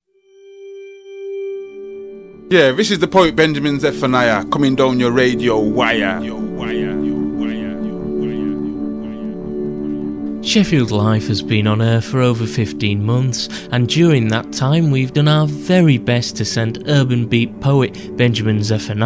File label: Appeal Jingle